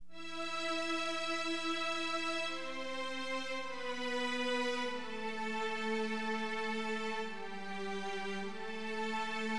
STRING, Synth; LiveAct Pool 1; AcidJazz Funk

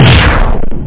laser; Ultimatum

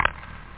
snap; da capo vol1; drums nature

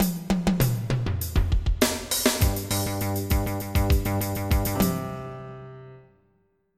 LIFTOFF

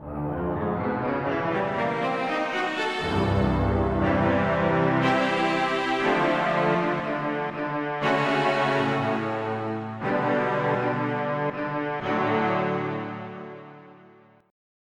Strings; FF7

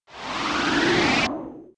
Spawn; swarm

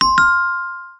correct

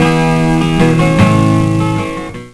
song; HyperStudio

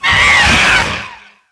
phoenix hit; DAoC

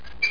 pump dor; landslor

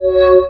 new Discipline; vampire demo

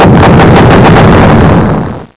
bomb

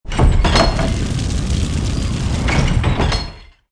garbage, buildings

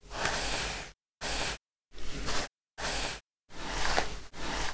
hair Brush; Singles Flirt Up Your Life

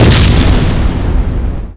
c4 detonation; SPECOPS

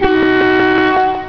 horn

